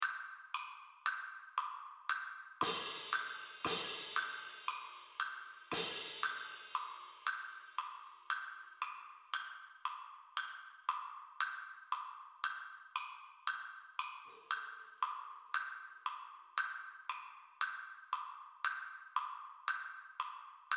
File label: Rodeo Parade March - Marching Cymbals; Cymbals Only